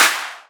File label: Claps; Vengeance - Essential Clubsounds Vol.5